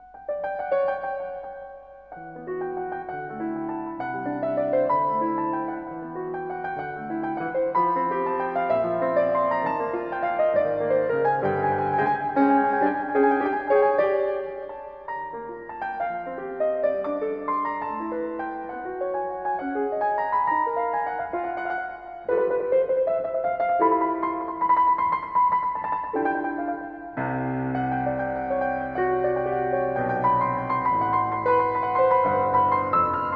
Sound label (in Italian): suoni cantabili, Piano